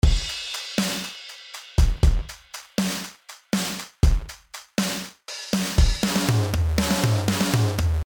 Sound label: Drums Dry